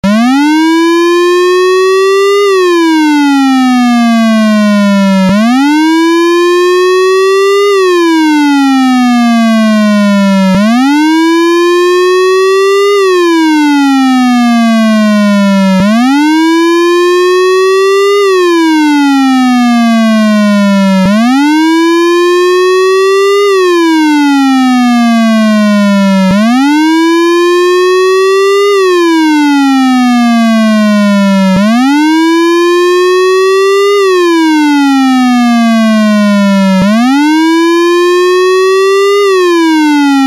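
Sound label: Siren Tone WAIL (RUMBLER)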